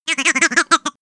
giggle tube sequence

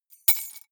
key ring large drop stone soft

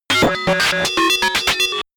calculator, comedy cartoon robot; soundpool

